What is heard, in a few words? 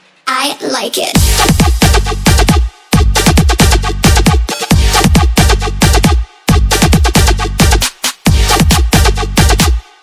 Cello